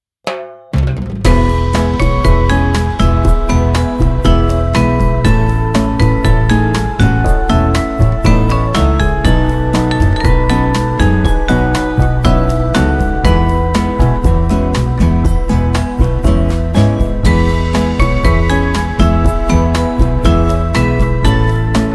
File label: Retro Elevator Ride, Bossa; Tunetank